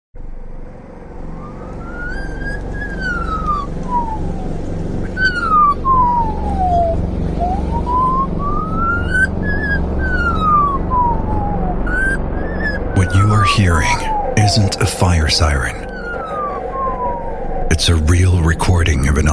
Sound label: Fire siren, Chainsaw; WWF; Nature is calling for help